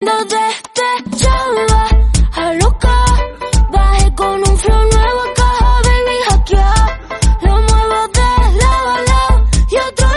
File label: Spanish Song